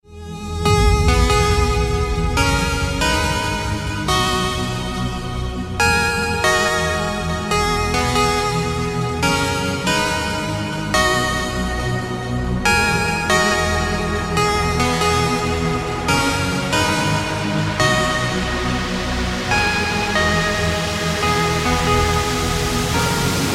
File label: Oppo Ringtone